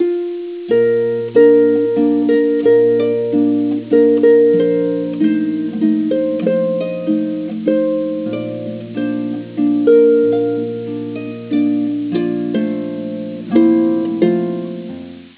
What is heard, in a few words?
harp